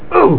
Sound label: die; Siemens SX1; Mozzies